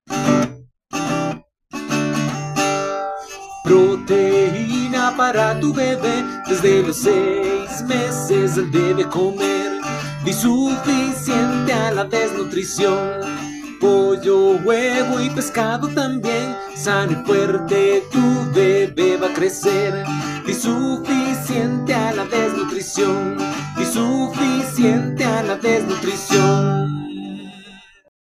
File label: Jingle